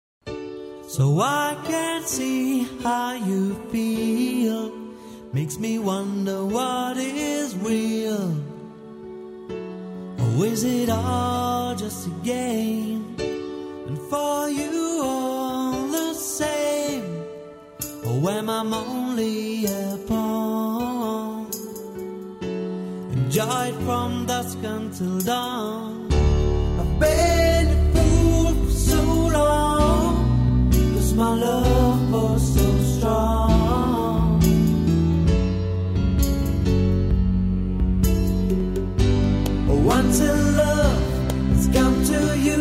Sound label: Fretless